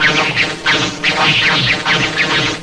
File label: burning charge, weapons